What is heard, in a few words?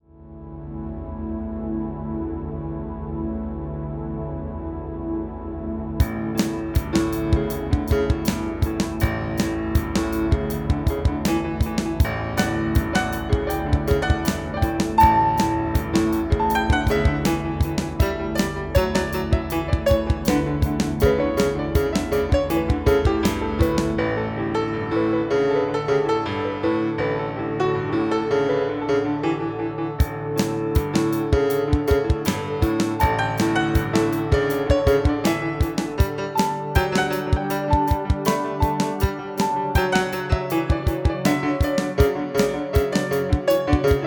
Piano D Major